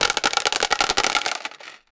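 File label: a wooden silver crate shaking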